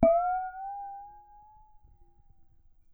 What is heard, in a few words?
Water Single, Glass